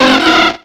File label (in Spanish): Grito de Wingull